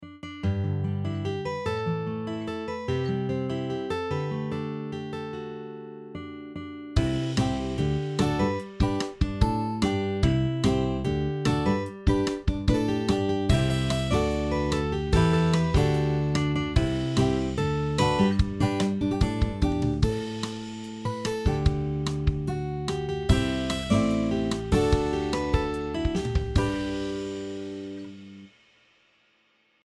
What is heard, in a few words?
ringtone